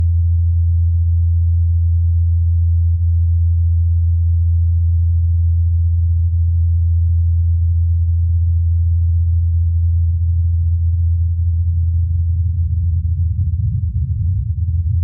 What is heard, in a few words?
mars sounds helicopter